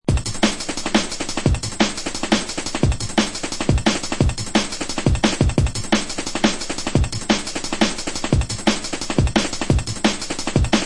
Dread Style Step, beats; ray keith dread jungle breaks vol 1 60 beats for dubplate clashes battle junkies

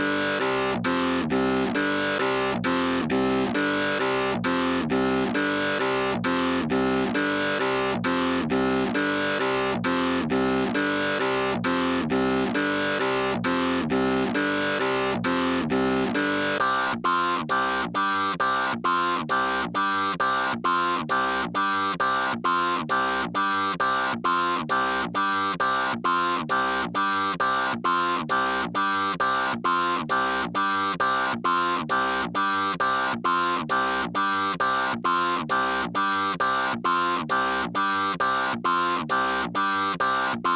Clavinet

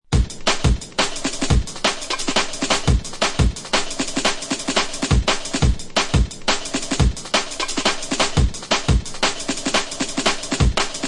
Apached Up Steppaz, beats; ray keith dread jungle breaks vol 1 60 beats for dubplate clashes battle junkies

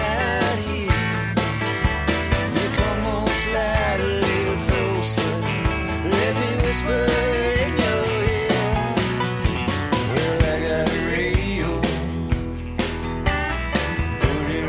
acoustic version

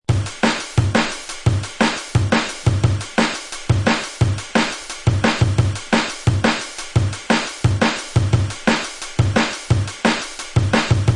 Dark Steppaz & Hats; jungle breaks; ray keith dread jungle breaks vol 1 60 beats for dubplate clashes battle junkies

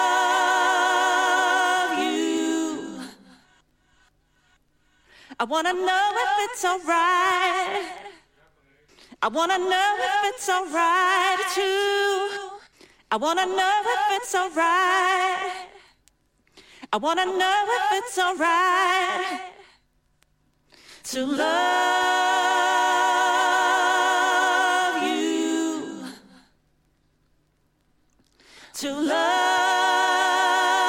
(acapella)

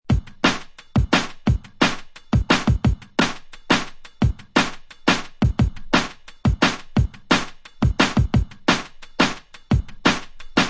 Techno 97 Steppaz, breaks; jungle breaks; ray keith dread jungle breaks vol 1 60 beats for dubplate clashes battle junkies

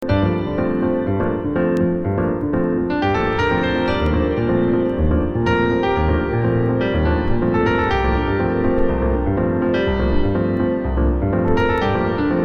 piano solo version

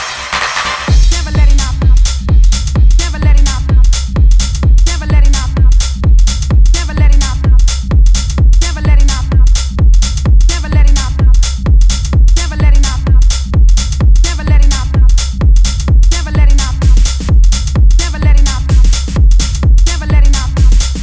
Techno Tool

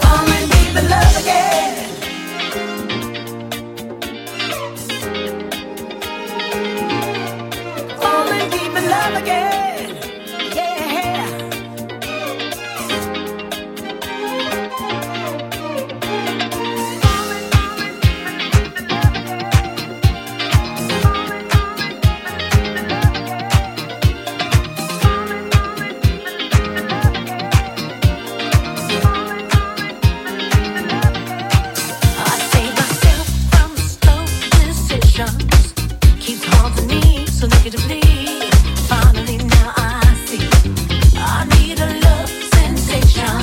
Disco Blend

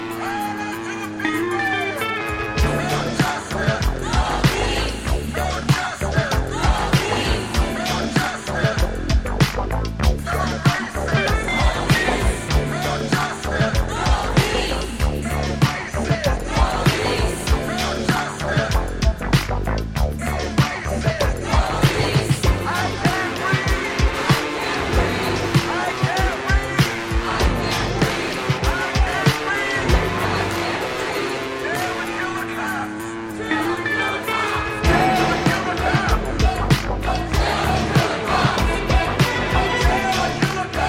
Horror Games (instrumental)

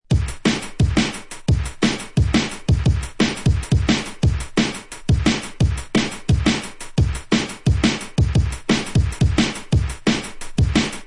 Kik An Dread Hats; ray keith dread jungle breaks vol 1 60 beats for dubplate clashes battle junkies

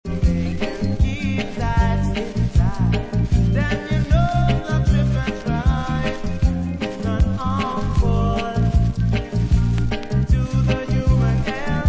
Reggae Beat

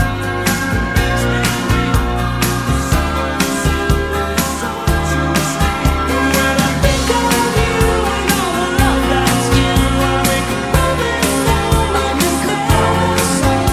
instrumental

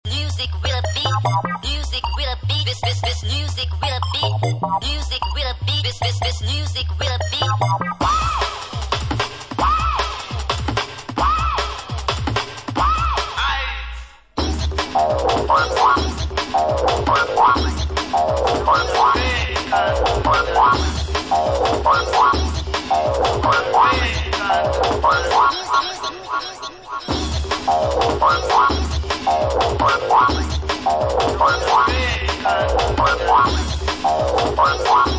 Music With A Beat

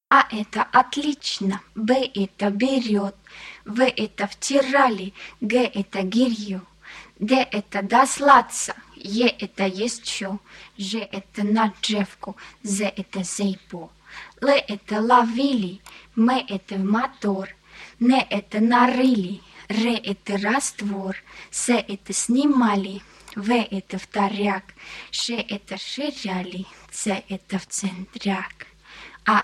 A & B (acappella)

